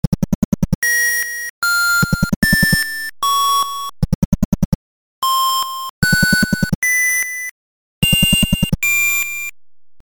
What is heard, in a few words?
elevator; metroid